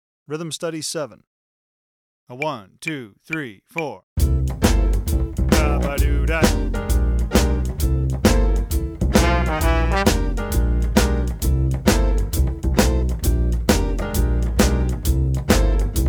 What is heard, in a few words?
shuffle rhythm